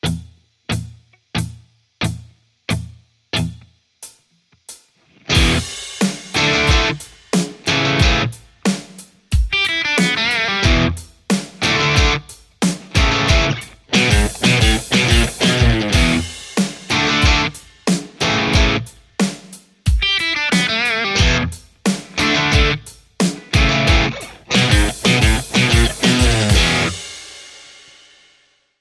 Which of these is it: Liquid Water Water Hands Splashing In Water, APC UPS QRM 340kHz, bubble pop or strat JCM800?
strat JCM800